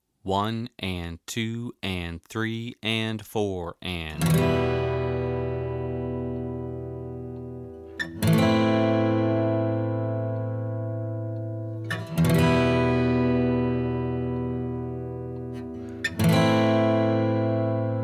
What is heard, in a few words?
A Minor Chord